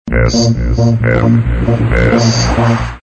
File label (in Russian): SMS; Реалтоны